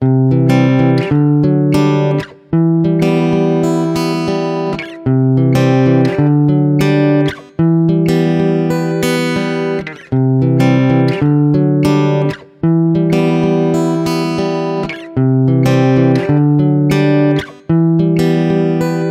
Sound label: Guitar 95 BPM (Cmaj); Guitar Loops; Cease; Ghosthack; Guitar Loops & Shots